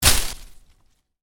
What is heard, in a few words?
Foliage Impact, Hard Foliage Hit; Impacts; Miscellaneous Impact